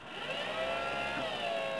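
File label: astromech Motor start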